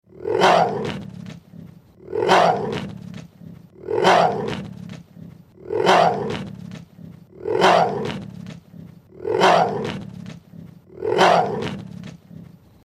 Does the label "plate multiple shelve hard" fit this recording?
No